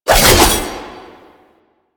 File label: Passive Sickle Spin; Warframe; Warframes; Dagath